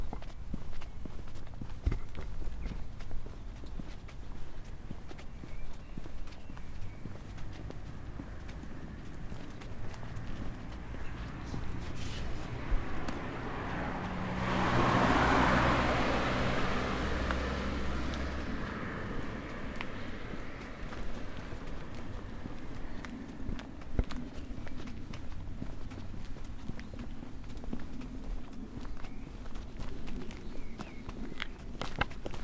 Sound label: Walking Down A Road